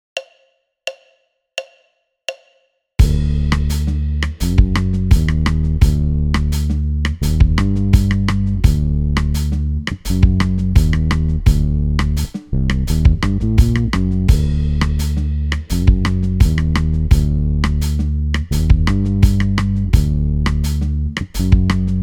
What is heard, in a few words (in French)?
basse A; projet modale